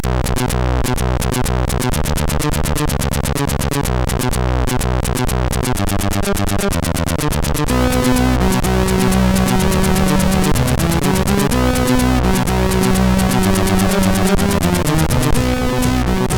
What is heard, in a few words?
Single Echo, MOS6581; MOS6581R3; hvsc